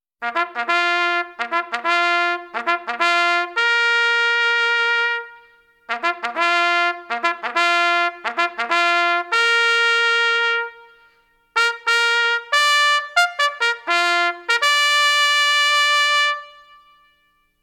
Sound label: rouse reveille